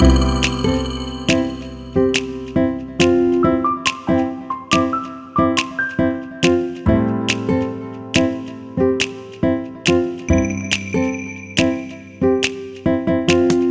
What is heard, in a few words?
Latin Jazz Loop